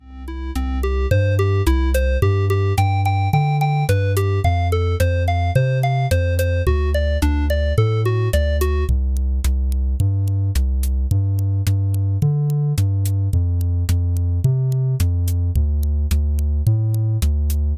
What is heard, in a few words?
music milkbar